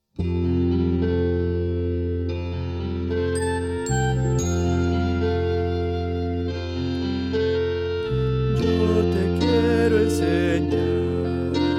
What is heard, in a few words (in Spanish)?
contraltos